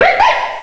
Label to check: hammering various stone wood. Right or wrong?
Wrong